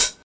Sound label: thin pedal hi hat sample B key, pedal hi-hat sound tuned to the B note